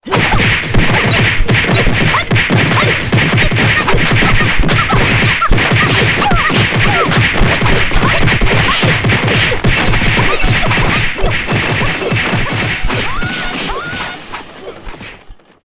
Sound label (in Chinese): 打斗-混战击打